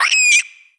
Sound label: headcrab pain